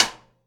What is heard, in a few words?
Acoustic Side Stick